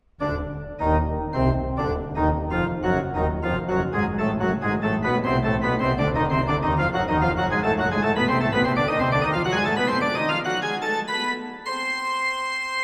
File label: dramatic organ